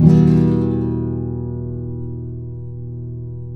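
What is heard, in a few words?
nylon guitar down minor F, flam chords; Spectrasonics; Hans Zimmer Guitars VOL-2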